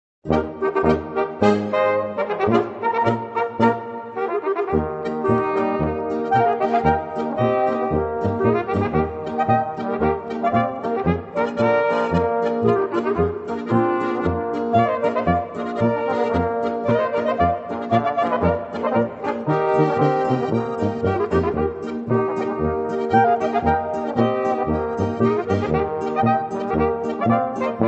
hoellengebirgsmarsch

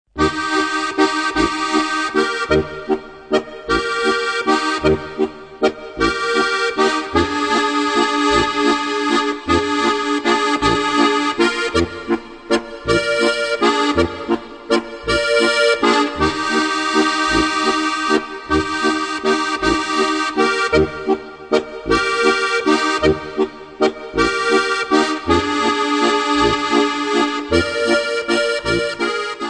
walzer

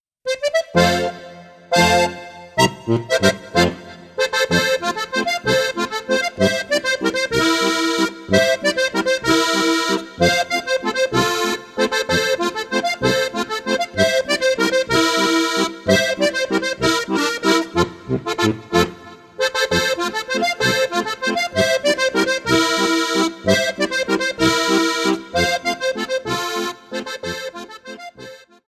landler

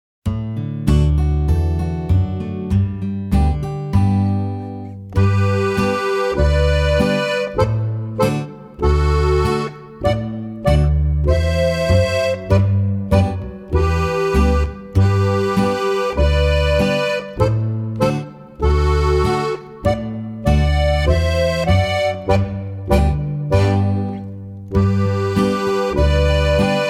ennstaler polka 3 knopfreihe; Knöpferl Geschichten 1

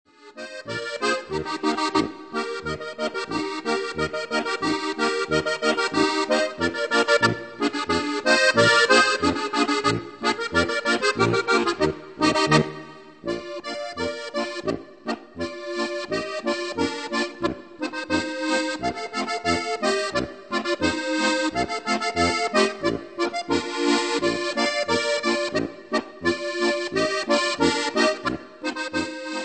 Jagatee Boarischer, Harmonika

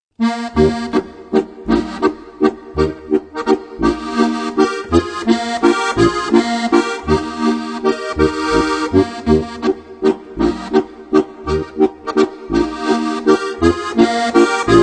Walzer

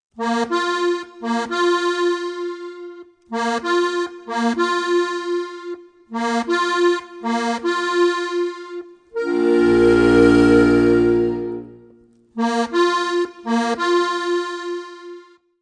aufbruch zur jagd signal; Halali

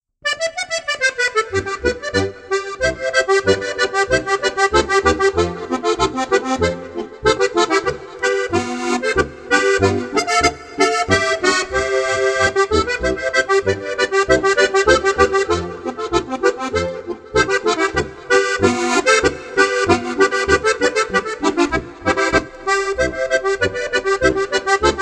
boarischer

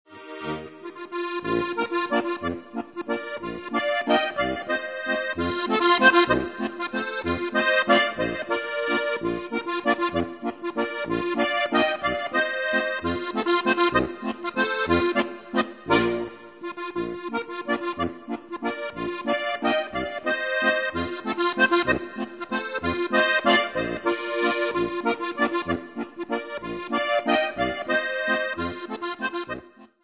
walzer

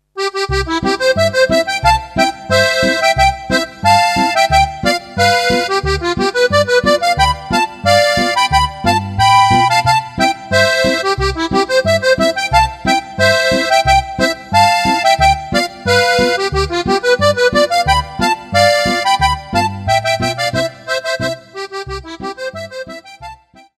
boarischer

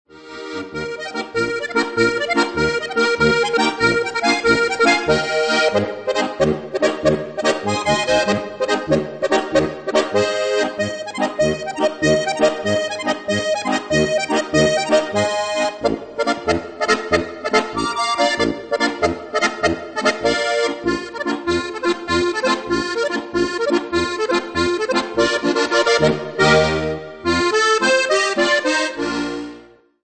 schleuniger typisch goiserisch

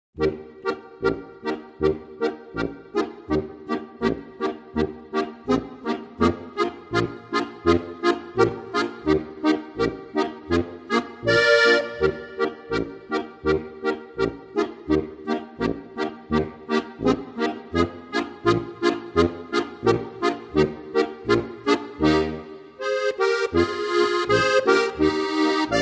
amboss polka; Mein erstes Spielbuch

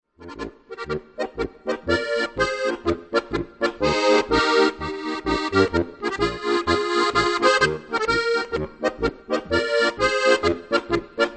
polka

